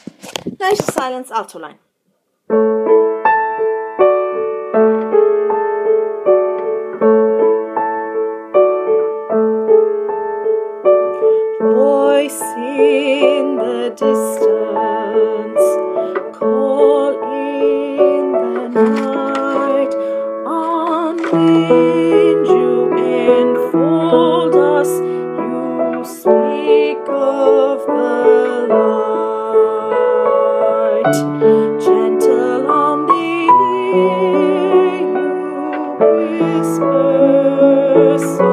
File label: Alto